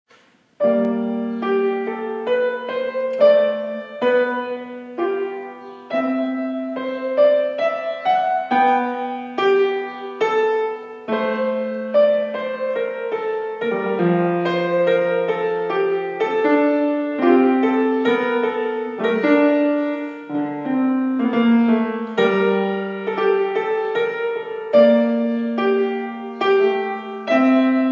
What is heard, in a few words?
minuet bach